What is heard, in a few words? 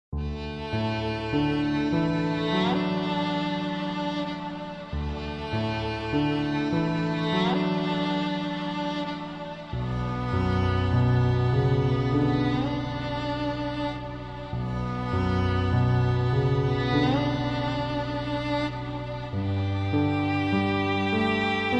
Relaxing Music